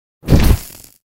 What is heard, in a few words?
fireball impact burn Delay; goddess of trampling